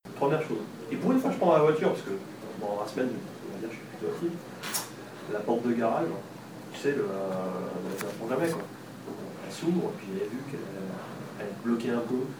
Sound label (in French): la porte de garage beatbox